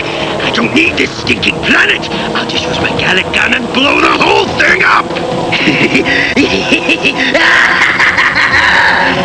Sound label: gallic gun; Vegeta's answer for everything, destroy it: "I don't need this stinkin' planet, I'll just use my gallet gun and blow the whole thing up